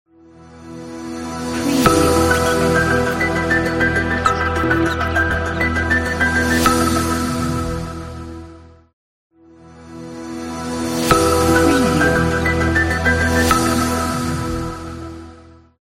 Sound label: logo tech